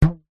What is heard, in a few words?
hair band pluck twang; foley; zapsplat